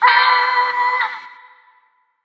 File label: pig